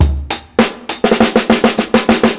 60'S STYLE 100 FILL, DRUMS LOOPS; BASEMENT DRUMS VOL 2

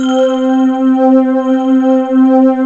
W7; DREAMING; Rubber Chicken Software Sample Bank

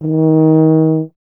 BRS TUBA, Solo Instruments

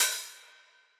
Open hat, retro house samples, Drum Hits

